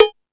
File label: Dance 2000, Drum Machines E-MU Pro-Cussion